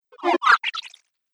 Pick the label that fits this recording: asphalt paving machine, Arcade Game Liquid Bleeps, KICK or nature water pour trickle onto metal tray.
Arcade Game Liquid Bleeps